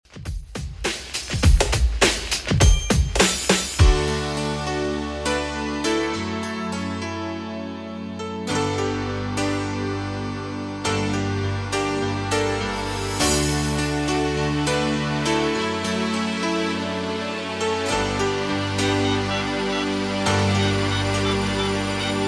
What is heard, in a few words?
90s Redrum, 102 bpm